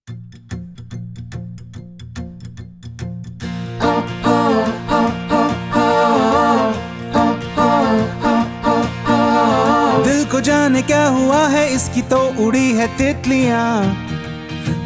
(Male Version)

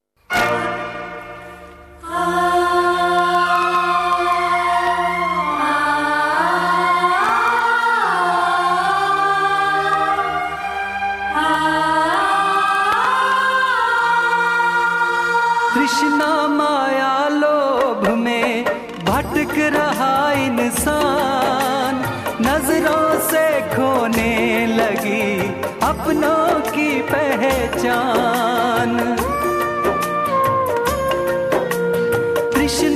Male version

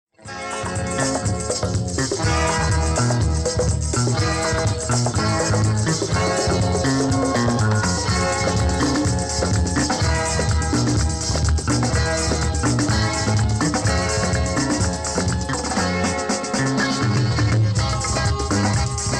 Instrumental

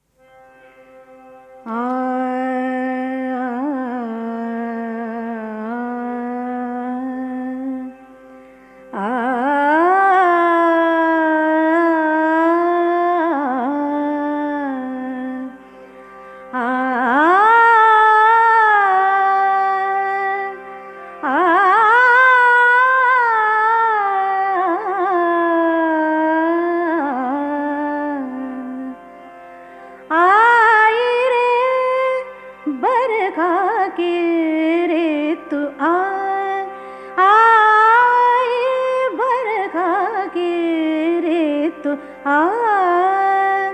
Alaap In Raga Malhar

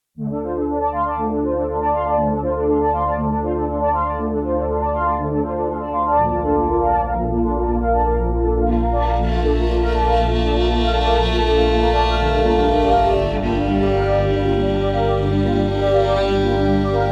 ARP Violin Mix

